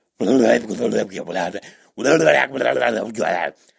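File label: shaman sounds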